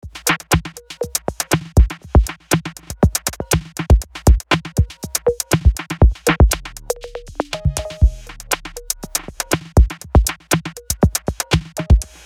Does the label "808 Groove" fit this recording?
Yes